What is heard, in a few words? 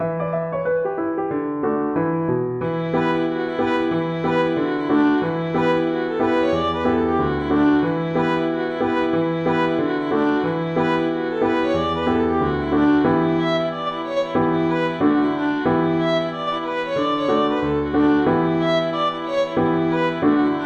the gravel walk, violin